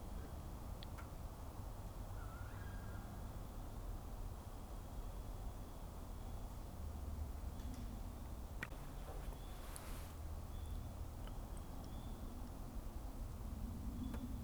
saw whet owl alarm

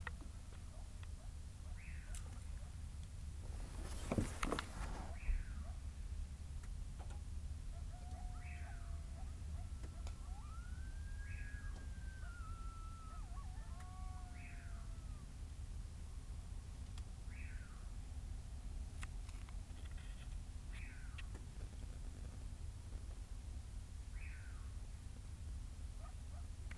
common pauraque

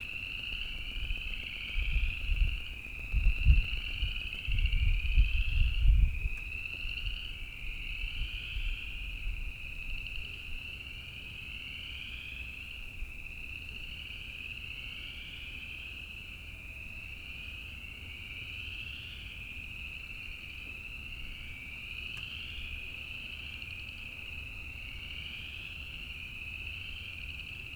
upland chorus frog

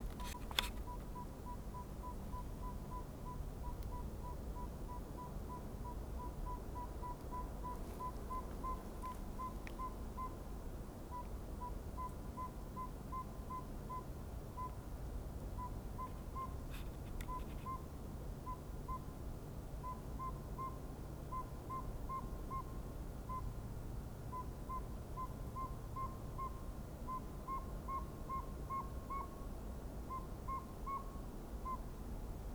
saw whet owl

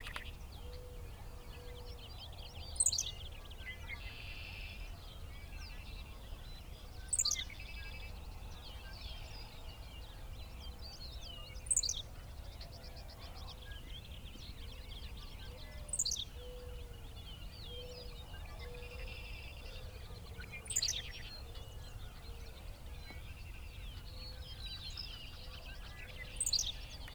Henslows Sparrow

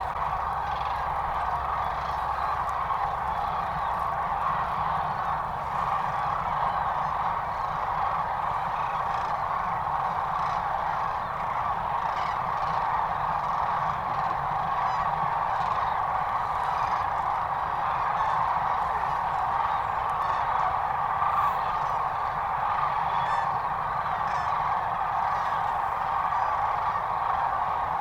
sandhill crane; whitewater draw

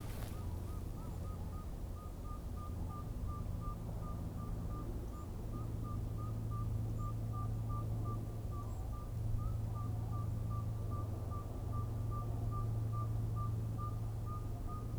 saw whet owl

